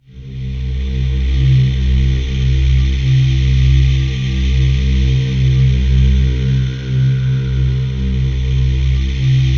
C3, Synth Leads

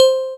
celeste C4; Greytsounds; Scott Peers Sound Engineering

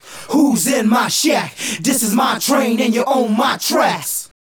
Male Group, Hip-Hop and RnB Vocals

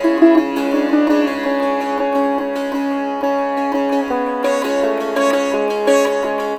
SITAR; Spectrasonics; Heart of Asia

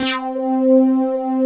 DRIVER SYN; Pro-Rec - Synthsonic

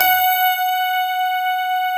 GRAND PF F#4, AKAI S-Series

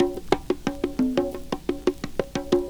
PERCS, 70 s Breakbeats

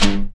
SYN SHORT, dance synth; Sound & Vision - Gigapack I CD 2 (Roland)

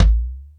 House Machine, Drum Machines

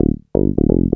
BSE 125 C, BASS LOOPS, House; Best Service ProSamples vol.10 - House; AKAI

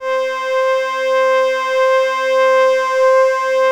LAMBDA, String Machines

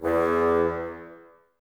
Bassoons E 2; Miroslav Vitous - Woodwind Brass Ensemble

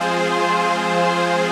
CHRD PAD; Best Service; XX Large Pads; Sample CDs

